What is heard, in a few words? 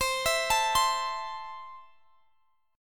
C 6th, Guitar, Drop D